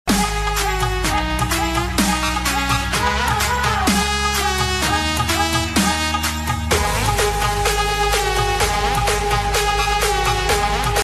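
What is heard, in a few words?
electro saxophone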